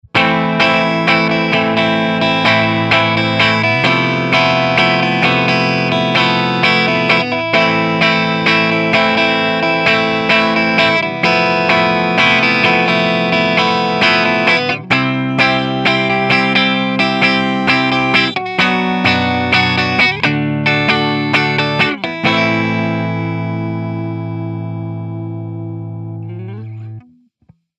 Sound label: Neck clean; Luna Sol